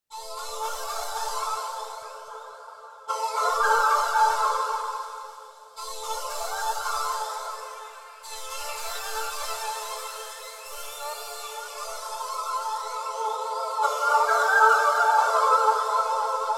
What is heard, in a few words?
Q Vox Pad, yamaha sy77; sy77 pads